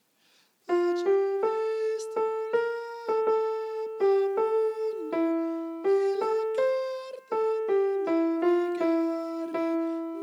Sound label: alto; aggiu vistu lu mappamundu